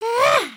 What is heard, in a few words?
ninja build forward, emotes